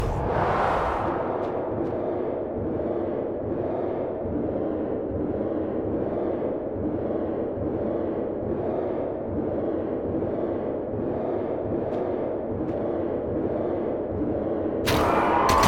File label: immortal cell deactivation; HLD